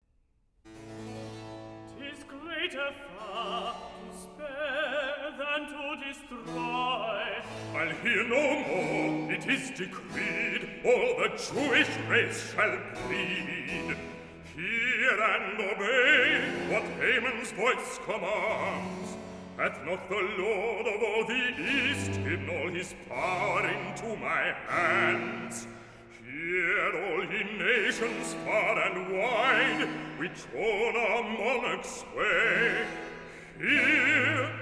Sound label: Recitative; George Frideric Handel; Esther 1718 version; Purim; 'Tis Greater Far To Spare'